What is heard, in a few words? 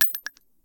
shell 39mm default; STALKER; IWP